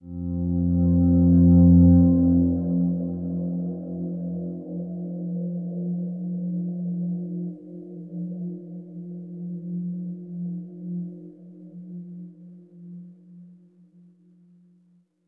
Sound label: Flytrap Overlay Drone; gamerip; Mirror's Edge Catalyst; PS4, Windows, Xbox One